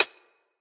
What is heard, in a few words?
Hihat Closed Acoustified